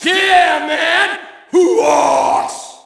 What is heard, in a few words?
Shout, audience; UFC Tapout 2